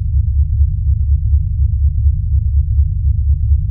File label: heart beat